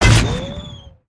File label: Spider Boss Fire Rain Shot; creatures mechanical